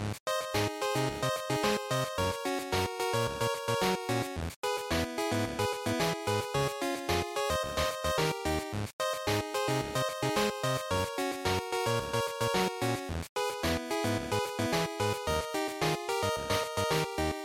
Racing, Result, Loop

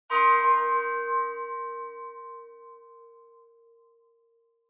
Horror Accent Close

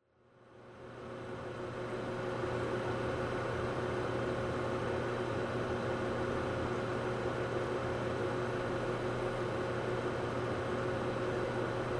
PC Operating Noise Long

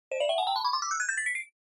Scene Change Slow Long

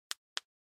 Cell Phone Hardware Button

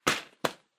Newspaper Hit

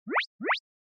Anime Motion Double

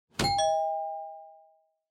Quiz Button Slow

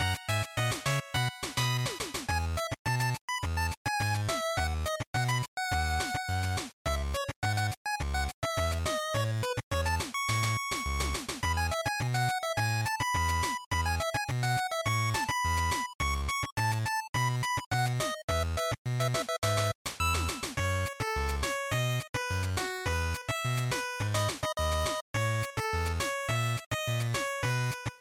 GB Action Stage3 Loop105

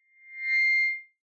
Megaphone Feedback Dry

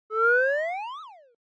Motion Pop

